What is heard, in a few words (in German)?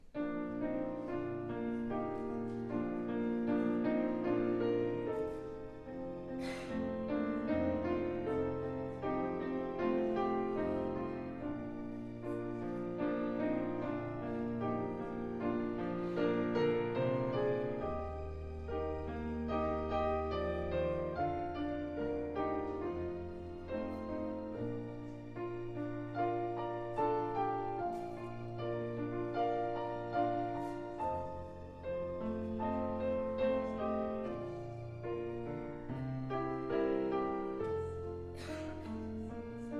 Vorspiel